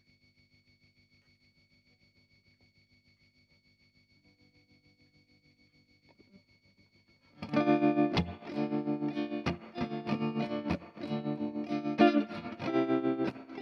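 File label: guitar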